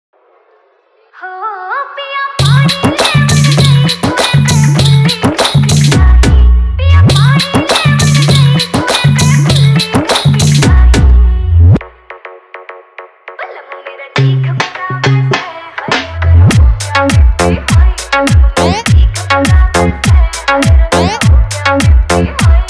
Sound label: Electro Punch Remix Song